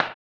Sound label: HAMBONE, Vocal Blues Soul; Spectrasonics; Planet